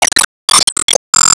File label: Enemy Gear Common Delete